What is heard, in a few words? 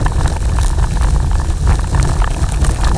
roll grass, terrains; Tony Hawk's Project 8; XBOX